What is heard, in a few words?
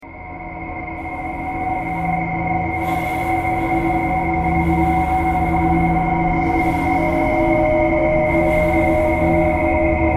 traffic kcore birds a traffic kcore birds a thread simple; bowedmetal